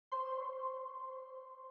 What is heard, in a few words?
Alone One Shot